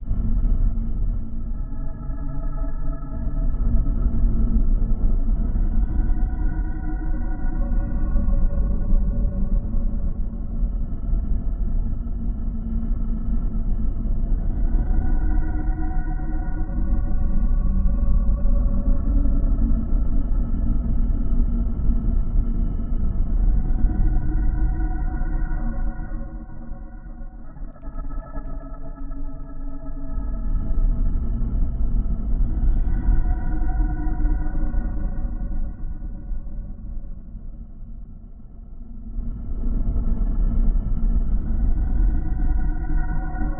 Hallway Low Quivering, deep

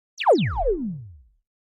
Laser Pulse Thump

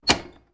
Switches Industrial

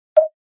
Button Hollow Wood Hi, buttons

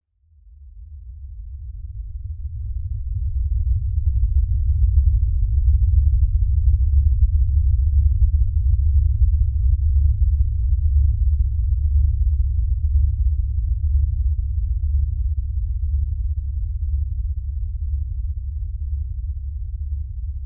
Space Ship Alien Mot; spaceship fx

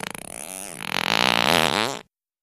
Character Fart Pus, farts

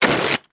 Balloon Deflating Qu; balloons

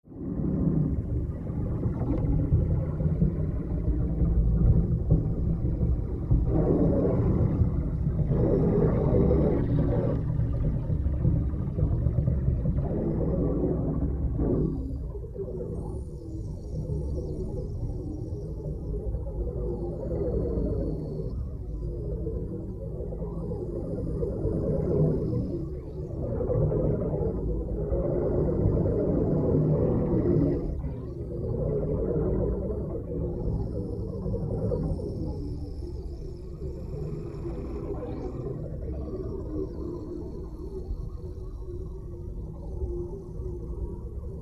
Air Bubbles Underwat

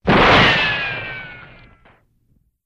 Ricochet, bullet bys & riccos

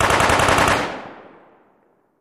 Machine Gun Sngl Rap, single burst

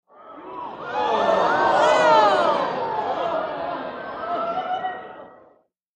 Theater Crowd Ooo, theater

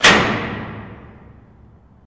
Jail Door Lever Sing; jail & prison doors

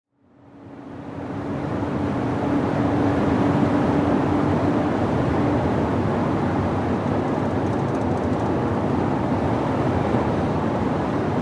SUV Drive 100 Mph

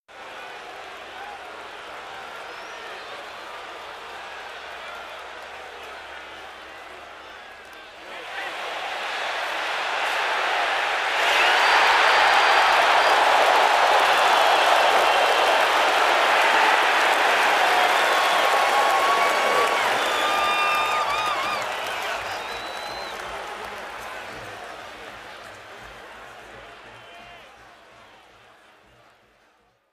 Football Crowd Idle, sports arena football

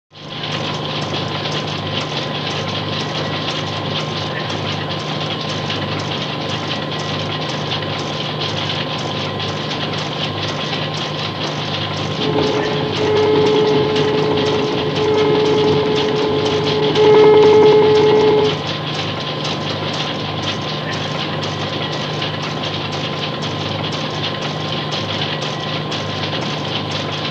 Computer Room Master

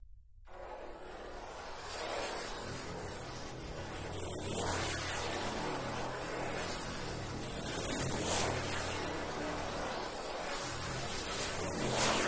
Drum Metal Scrape, metal groan atmos

